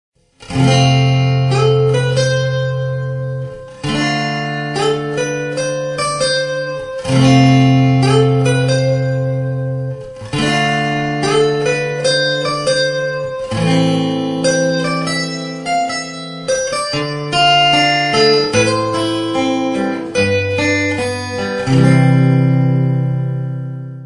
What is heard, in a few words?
Solo Intro